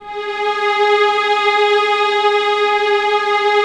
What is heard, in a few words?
VNS G#4, Violins; Miroslav Vitous; String Ensembles